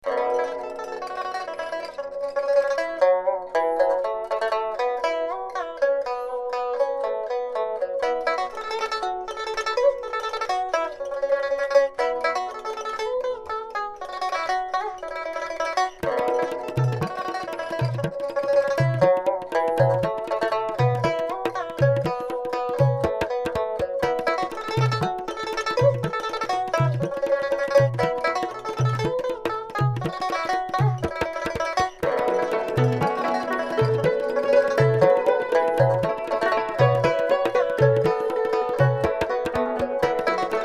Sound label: Song of the Rooster